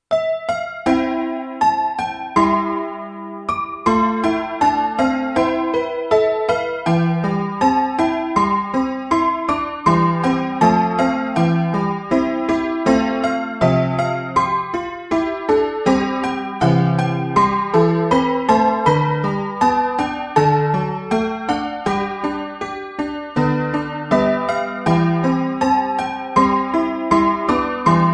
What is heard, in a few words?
flute broken piano; Pokémon; RBY